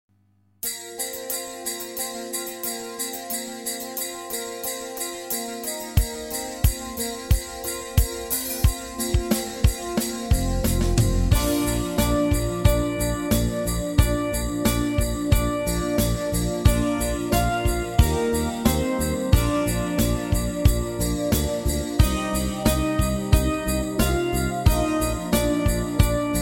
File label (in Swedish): Bjällerklang långsam; trumpet